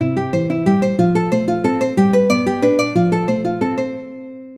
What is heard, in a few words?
Guitar Cadence; Asus Zenfone-10; ringtones